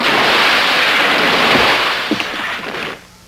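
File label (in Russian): звуковой эффект аварии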